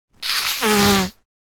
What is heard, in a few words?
Nose blow cold flu